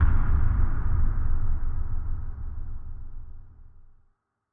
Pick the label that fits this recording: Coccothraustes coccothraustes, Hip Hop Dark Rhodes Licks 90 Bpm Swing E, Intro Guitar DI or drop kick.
drop kick